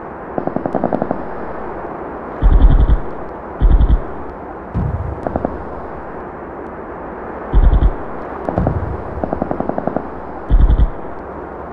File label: battle ambient